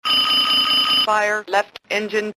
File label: fire left engine